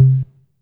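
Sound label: tom, Casio CTK-5000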